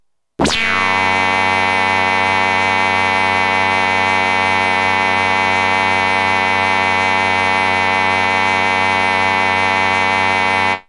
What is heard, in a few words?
breaks; Super Duck Breaks